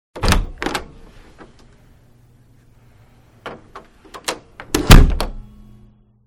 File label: Wooden door opens and closes; SOUND EFFECTS1